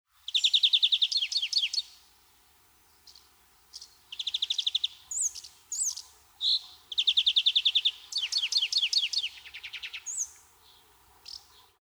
verdier europe chant

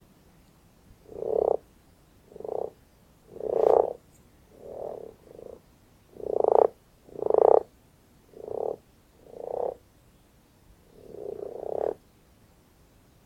Grenouille rousse

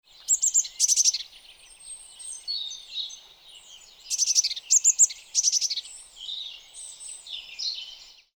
mesage bleue chant

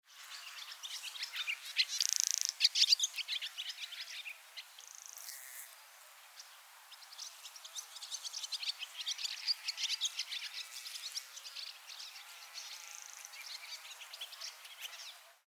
hirondelles fenetres

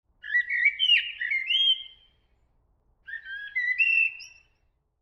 merle chant

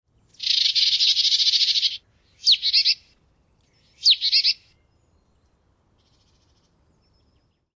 mesange nonnette

